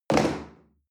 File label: chair wood place hard